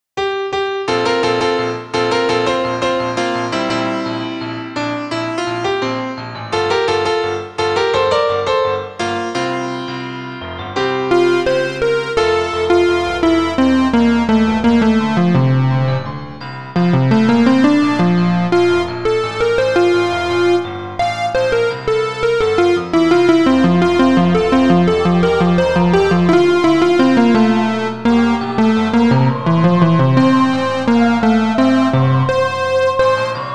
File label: chasse instrumental solo